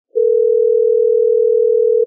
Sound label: ringback